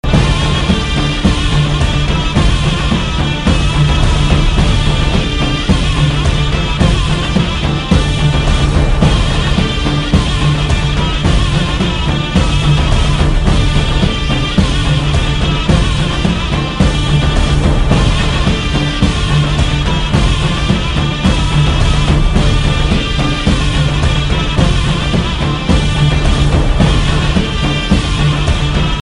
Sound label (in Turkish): osmanli modern mehter ringtone